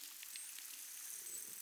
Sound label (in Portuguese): Assobio boto cinza